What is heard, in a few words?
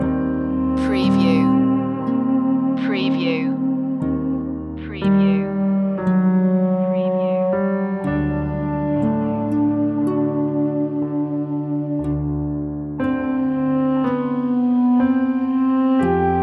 sad and black loop